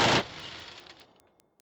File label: gun; mcheli